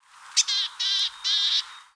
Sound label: Parus montanus